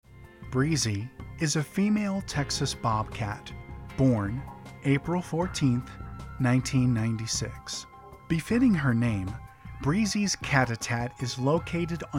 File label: bobcat; Breezy